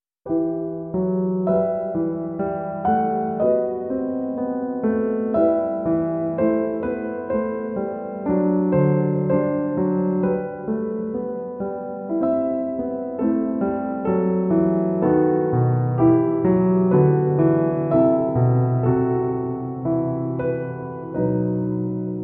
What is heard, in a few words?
Sarabande in E Minor